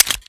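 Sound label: pistol reload empty mags mag out; gmod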